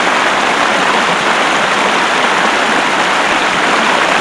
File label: river; ProjectMobius; ambient; enviroment